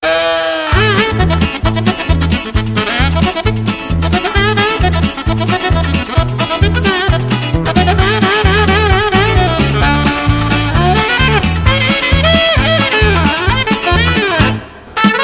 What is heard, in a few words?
music; jb snow